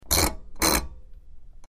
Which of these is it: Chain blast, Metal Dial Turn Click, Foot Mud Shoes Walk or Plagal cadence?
Metal Dial Turn Click